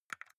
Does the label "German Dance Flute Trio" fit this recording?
No